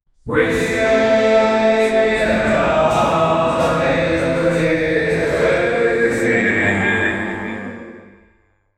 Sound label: acoustic advert; advertising